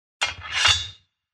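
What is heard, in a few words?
KATANA SWORD normal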